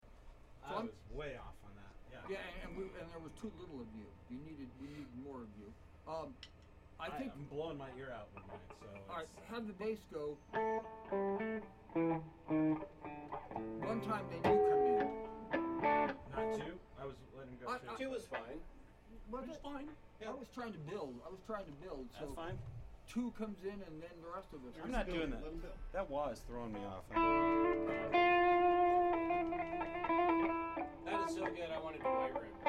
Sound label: Room Mic